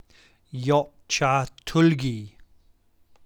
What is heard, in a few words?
Side Thrusting Kick